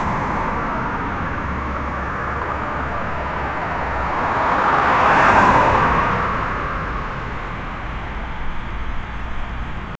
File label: a fast paste timelaps, time lapse